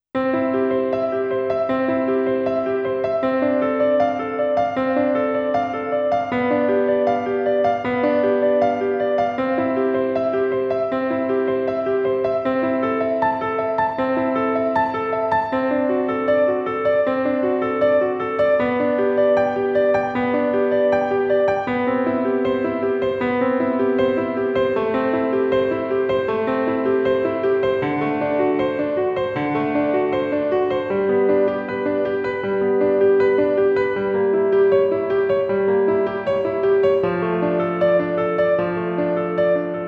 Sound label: Prelude No. 1 in C Major, from The Well - Tempered Clavier, Book I; Vivaldi, J.S. Bach, Pachelbel, Albinoni, Schubert